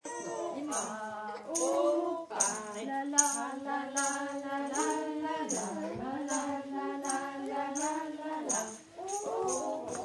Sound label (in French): marche rythmée